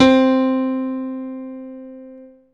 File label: PIANO, Dance Mega Synth; Best Service